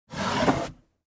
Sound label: Wooden sliding door opening